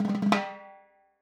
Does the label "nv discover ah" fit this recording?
No